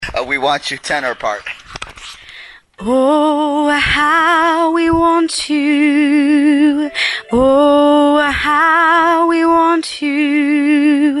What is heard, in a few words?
tenor